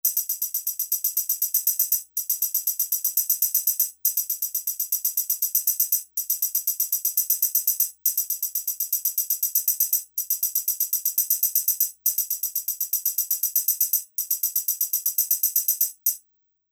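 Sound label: Tambourine Part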